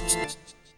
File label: Synth